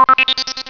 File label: TUNE; Zoemtonen hisses pingen woesjes tikjes